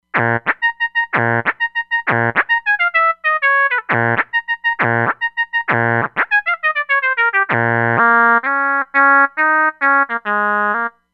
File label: Pulse Lead, Roland Fantom XR